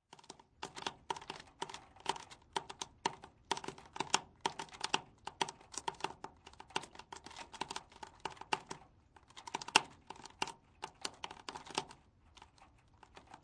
Gutter Dripping Water, Ambience, Gutter Dripping Water, Close Perspective; Outdoor; Outdoors